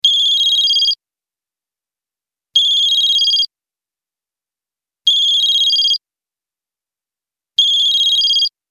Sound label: Basic tone, ringtone; Tecno Phantom Pad II (G9)